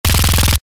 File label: Special forces submachine gun short burst